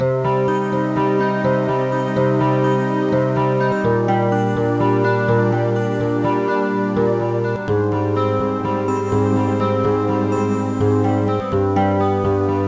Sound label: mod guitar light; Trackers; Modland